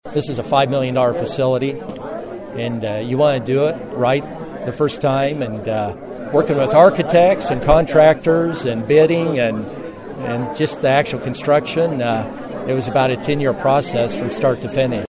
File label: harp one